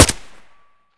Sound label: ak74u silencer stereo; STALKER; IWP